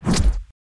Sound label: kick real swing